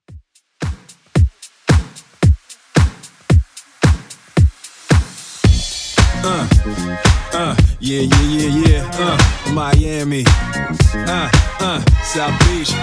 90s Simple Redrum 112 bpm

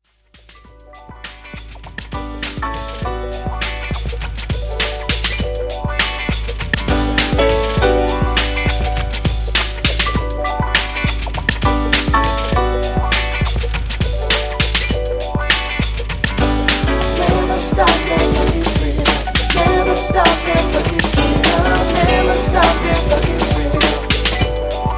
90s Funk ReDrum 101Bpm

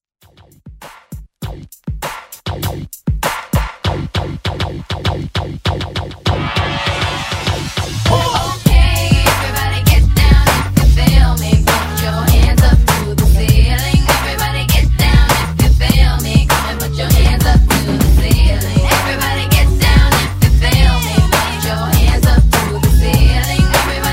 party break

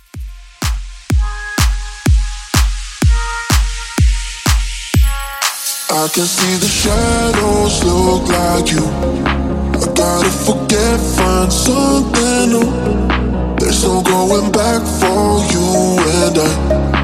Club House 125Bpm

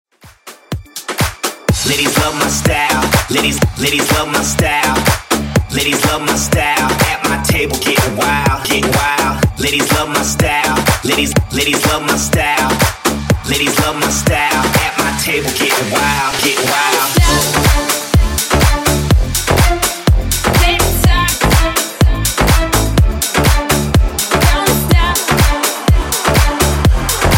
Hype Mashup, 124Bpm

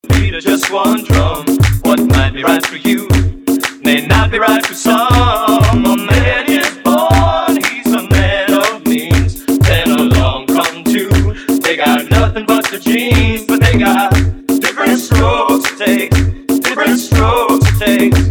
Riddim MashUp

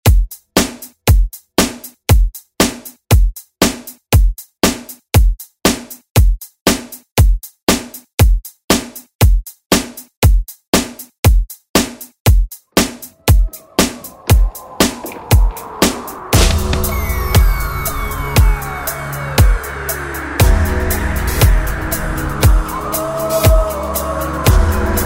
Re Drum

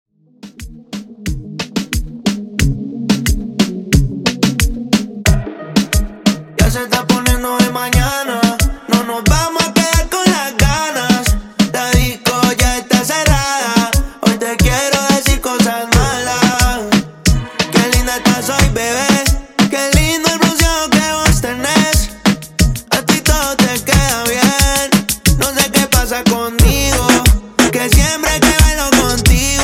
In Outro Latin Reggaeton 90Bpm

